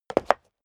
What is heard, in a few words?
step high heels concrete jump; schritte; Footsteps